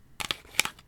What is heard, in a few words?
Credit Card Swipe